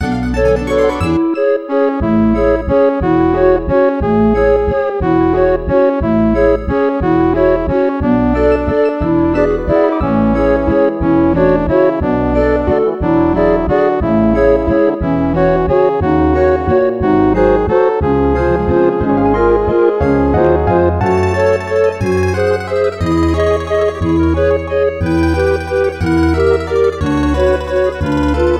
City Daytime with instruments; Phineas

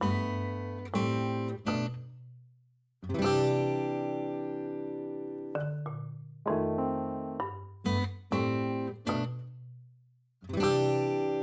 3 AM, Rainy

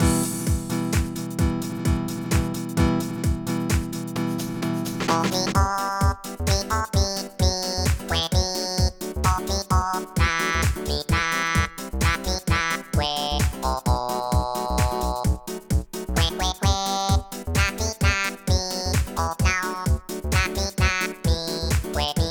Flamenco Remix

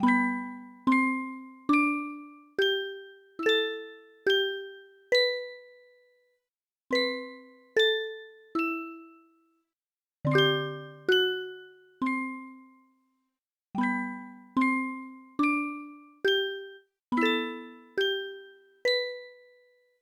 Lullaby (Aircheck, Hi-Fi)

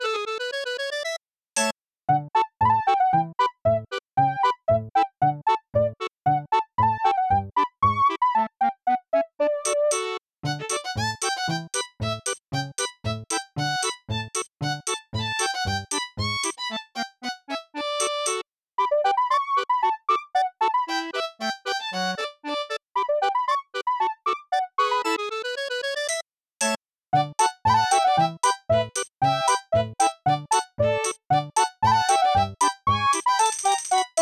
Polka, Aircheck, Hi-Fi

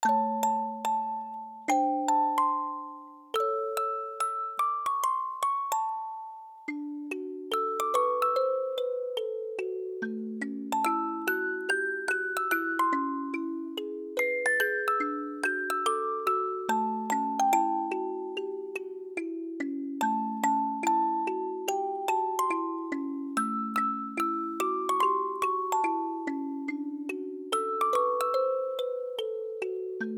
Music Box

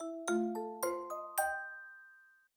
Start Decorating House

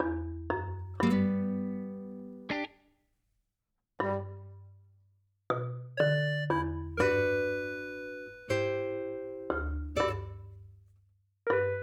3 AM, Rainy

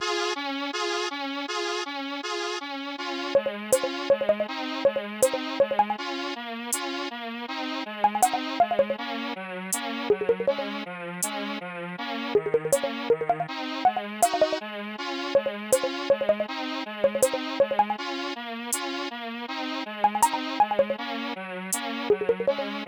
Ballad (Aircheck, Retro)

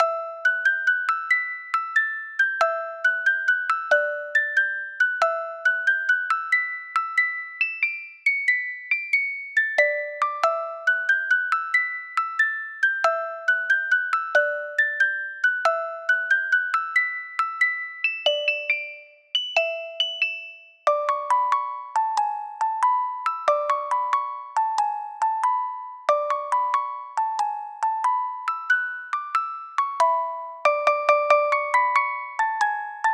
Music Box

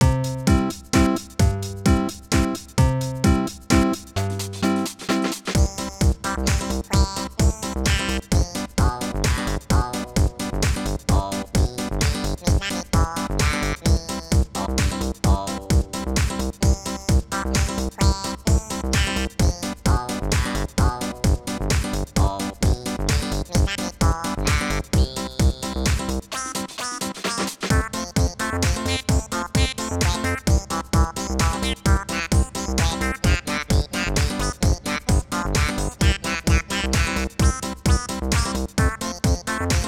House Remix

